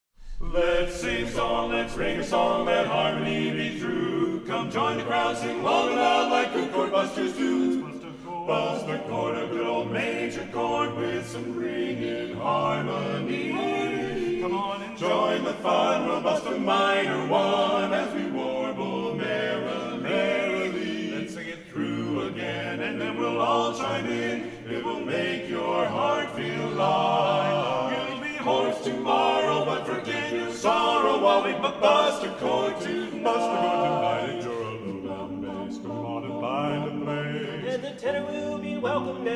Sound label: March